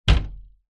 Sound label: household wardrobe door close freestanding wooden